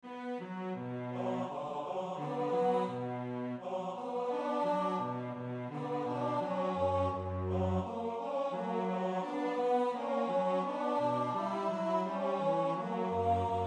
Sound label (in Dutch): orkest sopraan, koorpartij oefening; Telemann (Philip); Der Tag des Gerichts